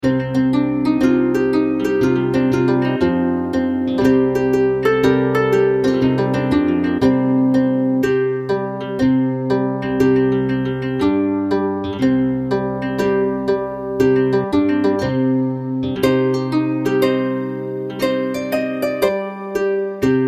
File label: celtic harp, soft, flowing tones